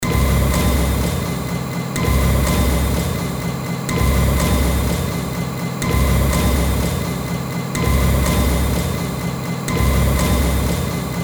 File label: industrial loop